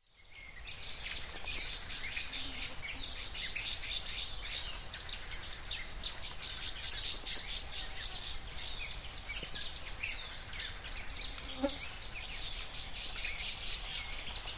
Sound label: Epinecrophylla haematonota, scold; S. America; Eastern Lowlands